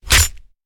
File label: Stab Bayonnet; Sound Ideas; Guns